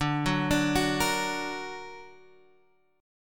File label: D Augmented, D, down; arpeggiated; chord